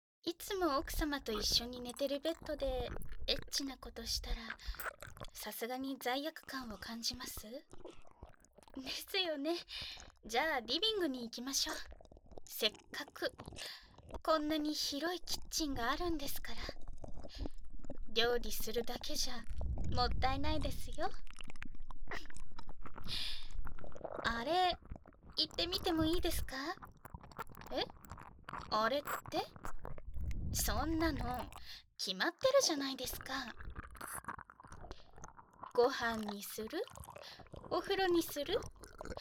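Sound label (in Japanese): 耳舐めされながら、巨乳お姉さんとディープキス・乳愛撫・手コキ・騎乗位・パイズリ・正常位する音声; 特典②17分間耳舐めされながら、巨乳不動産お姉さんとディープキス・乳愛撫・手コキ・騎乗位・パイズリ・正常位する音声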